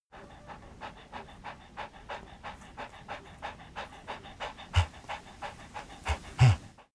dog pant; natur-tiere; hunde

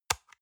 paper box small close hard; objekte

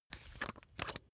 razor battery compartment screw open; maschinen; rasierer

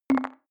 click code room, signale

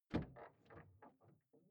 car door open exterior hummer h3

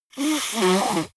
nose blow

